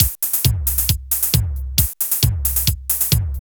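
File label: drum loops, right; Big Fish Audio; Prosonus 500 Drum Loops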